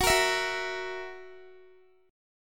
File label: Gb 7th Gb down Guitar, chord; Listen to Gb7 strummed